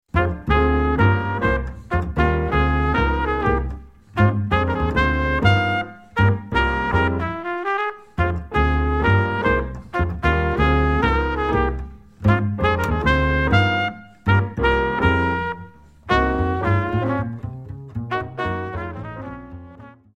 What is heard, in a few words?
minus Drums; medium up; in these drum solo sections, finger snaps have been added on beats 2 and 4; count off sets up the intro